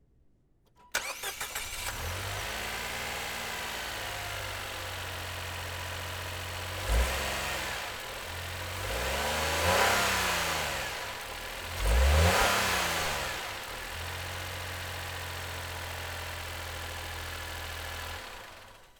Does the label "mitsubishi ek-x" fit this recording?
Yes